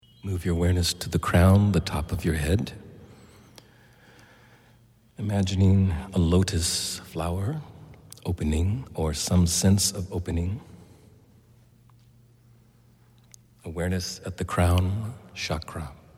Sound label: Seventh Chakra Sahasrara Sound Meditation